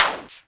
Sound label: polyvox groove, Polivoks; Formanta Radio Plant